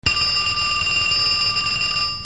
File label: Phone